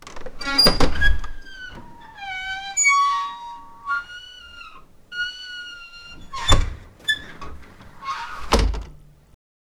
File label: environment